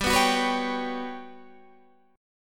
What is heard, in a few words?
G Major Sharp 11th G, Guitar Standard; strummed; 15,14,16,16,14,15; capo=14